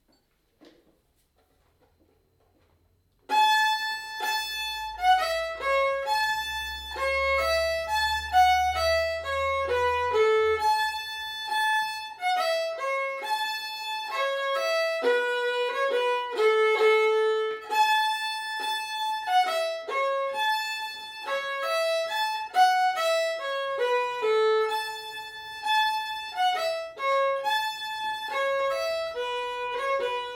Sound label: polka bruno seul, violon